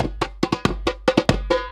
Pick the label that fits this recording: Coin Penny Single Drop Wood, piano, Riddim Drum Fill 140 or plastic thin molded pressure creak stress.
Riddim Drum Fill 140